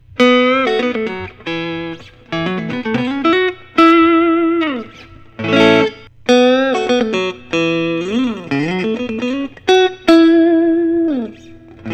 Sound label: Fender Custom Shop Strat; rory gallagher